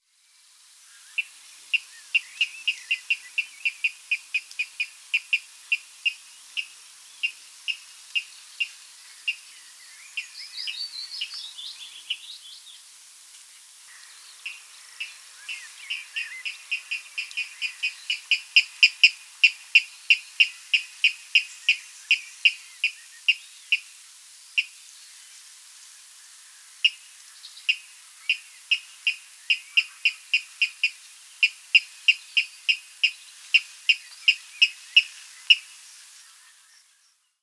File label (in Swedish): Vattenrall